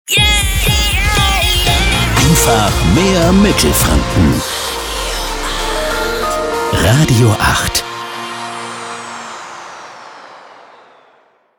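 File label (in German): Transition Fast Pop 2000er to soft aktuell; RADIO 8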